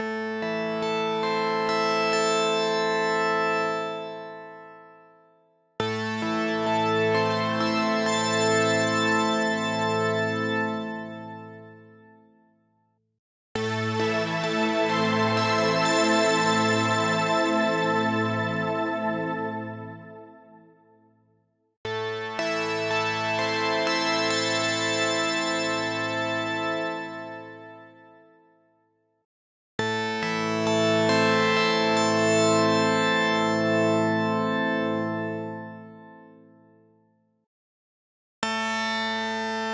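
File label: no effects saw piano, PADsynth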